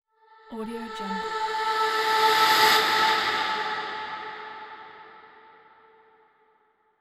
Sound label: cinematic breath; cinematic breath sfx